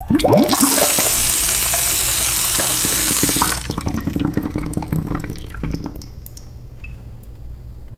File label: water gurgling down a shower drain